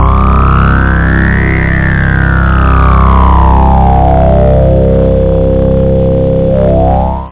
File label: long synth; Amiga-CD 1997 #7-8; algomusic